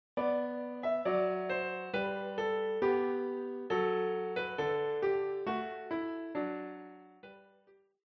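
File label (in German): Brüderchen komm tanz mit mir Klavier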